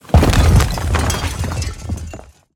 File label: building collapse debris falling rock rubble glass; sonniss gdc